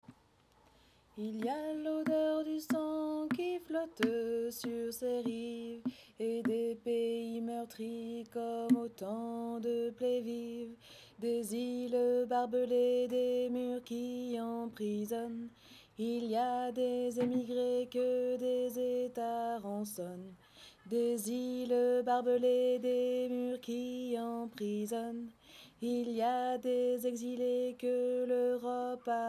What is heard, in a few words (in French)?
basse